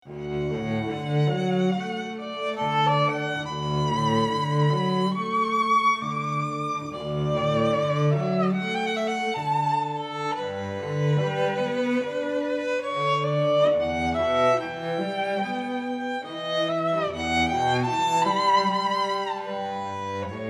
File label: meditation from thais; massenet